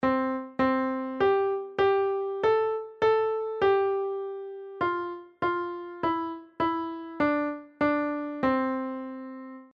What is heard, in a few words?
intervals, musical intervals; twinkle twinkle